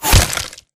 bullet generic head kill shot human; Titanfall 2

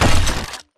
footstep shuffle; Titanfall 2

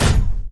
Footstep Jog Close; Titanfall 2

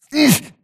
grunt hits; Titanfall 2

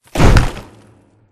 Small Concrete Wall Stop; Boomtown; Titanfall 2

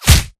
bullet light ballistic flesh; Titanfall 2

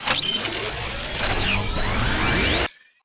spectre overload close; Titanfall 2

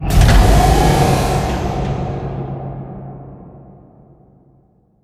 Giant Floor Panel Assemble Start Mech; Boomtown; Sideways Town; Titanfall 2

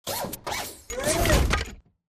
bt combat walk to idle; Titanfall 2

